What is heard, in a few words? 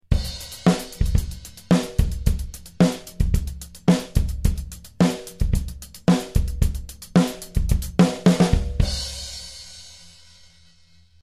16th beat